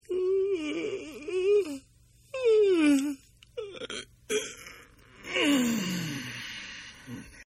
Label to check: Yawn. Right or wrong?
Right